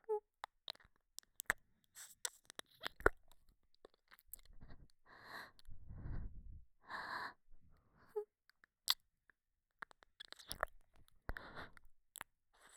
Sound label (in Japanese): ちろ…ちる…, 左耳, ASMR